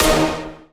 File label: punch D#5